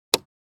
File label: Motorbike indicators turn indicator off; vehicle